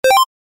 決定

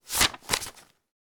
札の音; ボードゲームやカジノ、麻雀の効果音です。

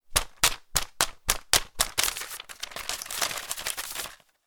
札の音; ボードゲームやカジノ、麻雀の効果音です。

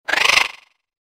モンスターの鳴き声

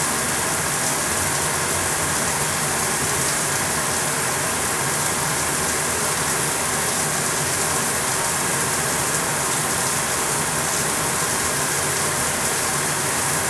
シャワーの音; 日常生活の効果音

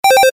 ポーズ レトロ

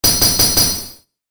ランク・星 レア度4 モノラル

